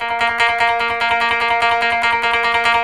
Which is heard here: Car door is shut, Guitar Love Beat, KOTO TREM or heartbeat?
KOTO TREM